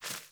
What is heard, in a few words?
Sparrow Quick Move, Movements; Animals